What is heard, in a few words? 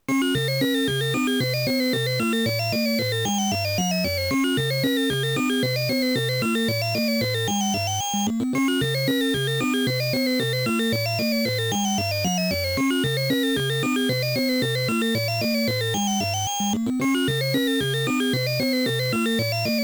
High Score Music; Phozon; arcade